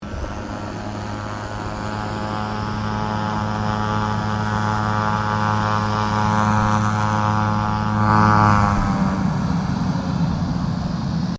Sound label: King Air 350 preflight, stereo; Airplanes; Vehicles